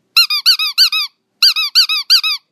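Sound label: latex squeak, dog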